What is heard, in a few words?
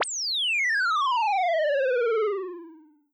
fall